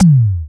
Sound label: Casio CTK-611 Syn Drum